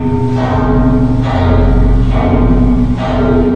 pole loop; hl2

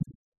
Spaceship Interface; Membrans; Spaceship Interface Sound Pack 01

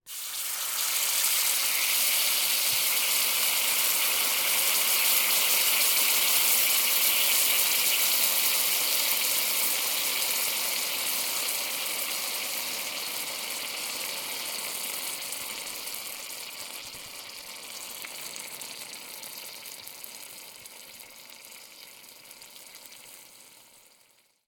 Sizzle Frying Pan; Foley; Soundopolis; Foley Plus

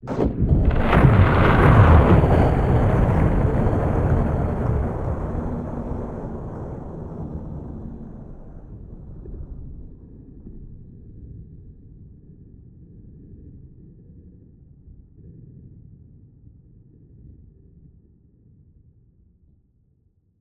Planet Explosion Low; MatiasMacSD; Eradication

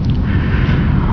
wall bit, ambient; hl2; kraken

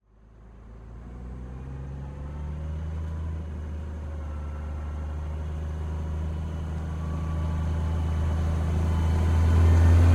Farm Tractor Magnum 7250 EXTERIOR Pass By STEREO; MKH8040 MKH30; Dramatic Cat

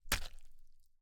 gore, wood beating flesh wet blood splat, soft; Timothy McHugh; Gorification [HD]

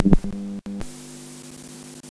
Analog Tape Noise; Membrans; Analog Tape Noise Sound Pack 01

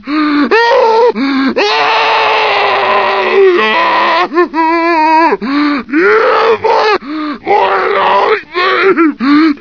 moan loop, zombie poison; hl2

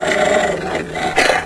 peek squeeze, antlion guard; hl2

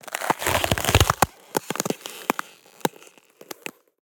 ice crack break; Ice Cracking; BluezoneCorp

